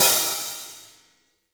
hihat - opened; JazzFunkKit

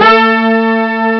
m1 super brass, Amiga Soundtracker Sample Packs

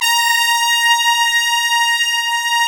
BRS TPTS, Brass Sections, BRS_Tps Velo-Xfd; Roland LCDP06 Brass Sections; Tpts mp)f

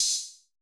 cymbal, Roland MC-909

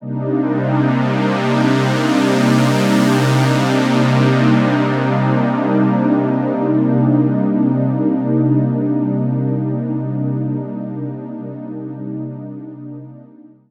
D&B Pad; neuro

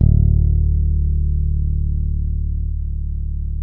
bass, sustain; Sampleheads; Will Lee Bass Library

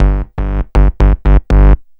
BAS, 2 Step

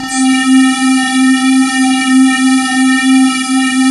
SQUARE, SynthLine; 90 s Sample CDs; NiceBeats; SynthLine VOL-2

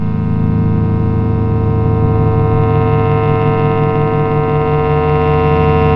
Ground, Industrial

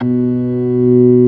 ORG.3 B2; B3 FULL

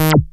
SHORT ACID, BASSES; Masterbits - Vintage Voltage; VV BASSES

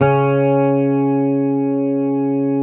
Hamer 12, SUS, C#3, Bass; Sampleheads; Will Lee Bass Library

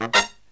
HI CHORD, Brass

